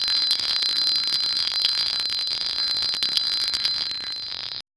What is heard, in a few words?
bell chime loop; musical